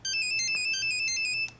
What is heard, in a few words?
nokia 3210 chase ringtone